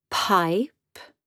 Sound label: pipe